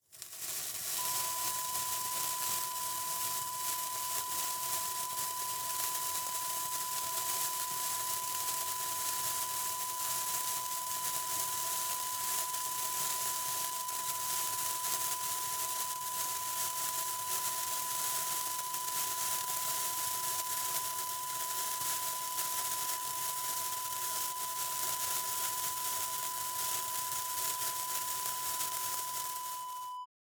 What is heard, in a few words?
sparkle stick